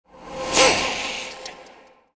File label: sword hit